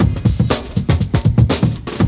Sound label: NEW RAVE 121, loop, BPM; 115-121 BPM